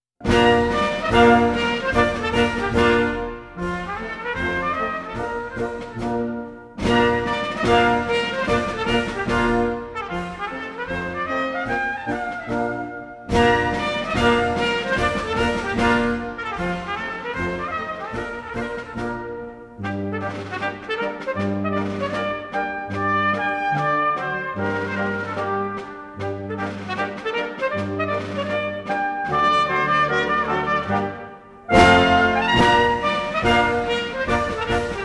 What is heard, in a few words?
Schottische Quadrille